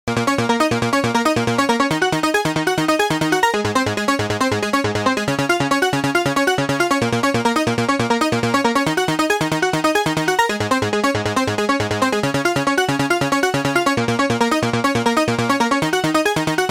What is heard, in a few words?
EMU ESI4000 90ies Dance Poly Lead